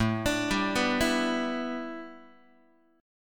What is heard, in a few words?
A 7th Suspended 2nd Suspended 4th A, Guitar; arpeggiated